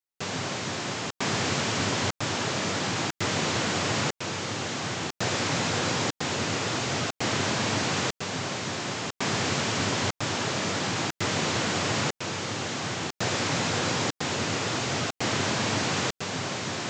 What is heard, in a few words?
Stepped narrowband Surround-Sound frontal pan; Surround Sound Setup and Imaging; Surround Spectacular